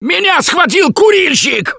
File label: Choke, russian, Survivor Voice; Gambler